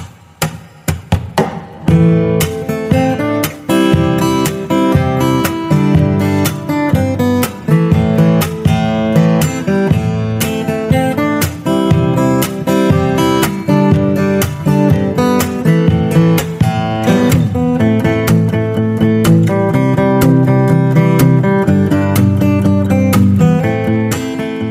Galaxy Note 10 Pro, tono de llamada

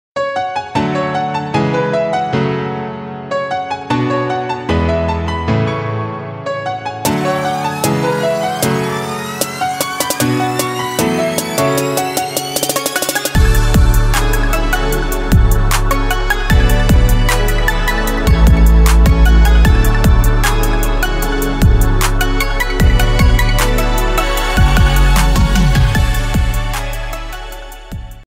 Trap Remix, tono de llamada; Naruto

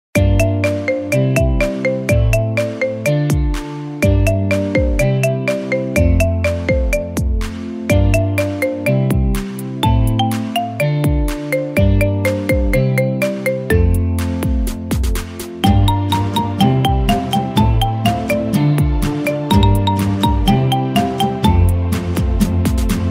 Play Date Marimba

